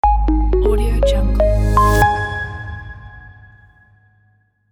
logo; boxbeats

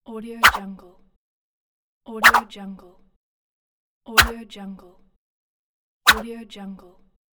fast swipe

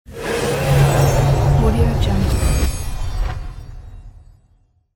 magic spell

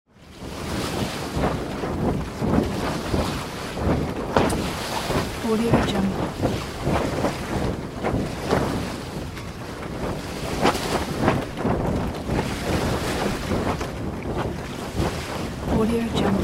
sails in the wind

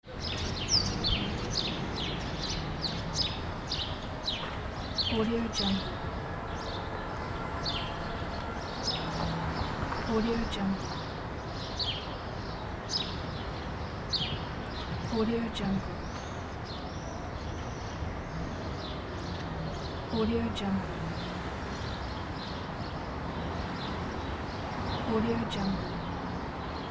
urban summer day ambience

